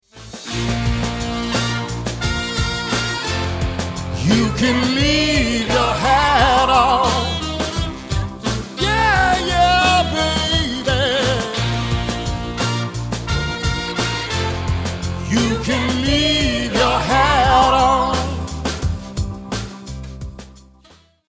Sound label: Sings